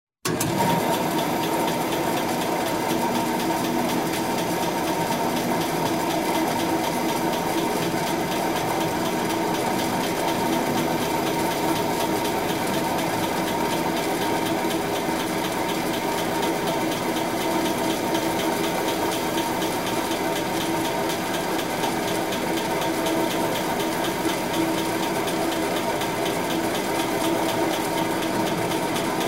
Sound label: traffic